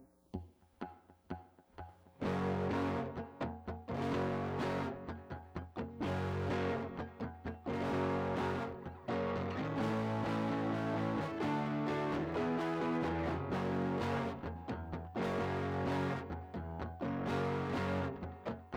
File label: riff